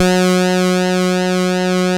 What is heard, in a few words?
TEISCO, BASSES